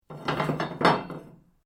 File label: metal rods move